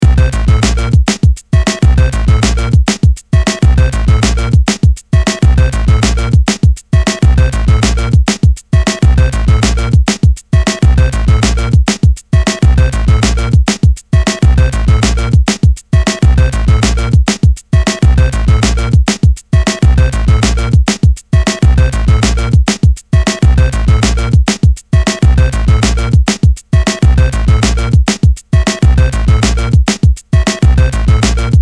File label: Drums Side - Untracked